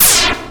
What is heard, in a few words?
laser